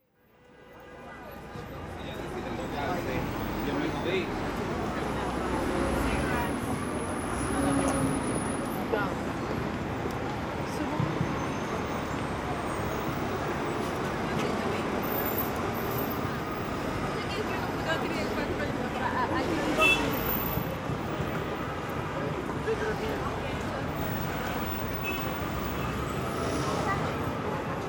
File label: crowd times square in nyc